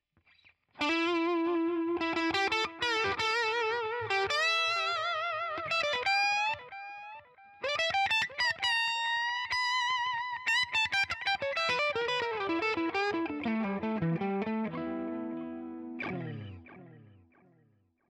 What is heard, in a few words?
Boss Nextone EL34 DIST rev dly